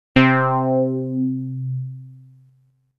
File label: Moogli-Bass C